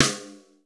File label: RING S; Crypton - DrumBase5000; R70 KIT #1